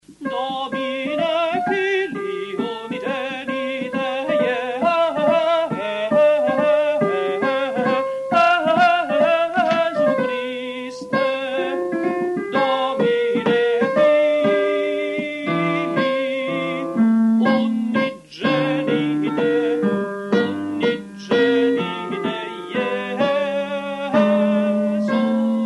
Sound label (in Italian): Domine Fili Unigenite Soprani; Vivaldi Gloria